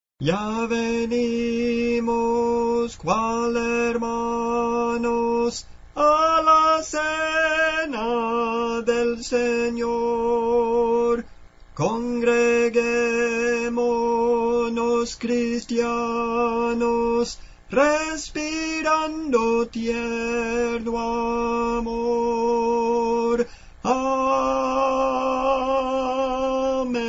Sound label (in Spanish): Tenor, Cantos Espirituales; 029 Ya Venimos, Cual Hermanos; Clase del Canto